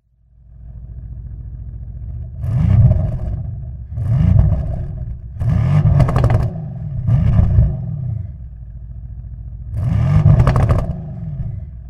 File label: Ultra Performance Hybrid Sound